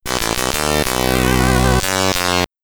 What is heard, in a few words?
Get Ready!, SID Stereo; Elvira The Arcade Game; commodore 64